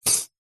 household cutlery handful set down on soft surface; zapsplat